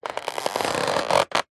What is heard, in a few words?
foley cardboard condensed thick squeeze crush squeak; zapsplat